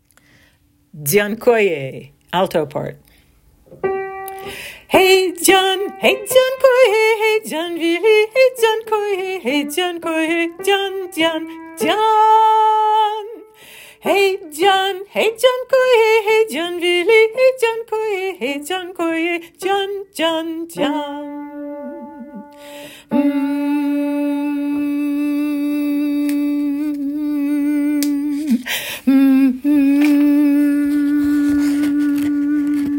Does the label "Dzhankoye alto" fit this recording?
Yes